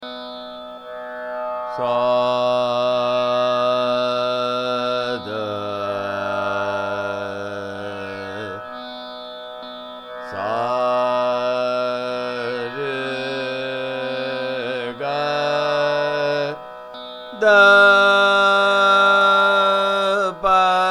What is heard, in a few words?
Bhoopal Todi Mukhya Ang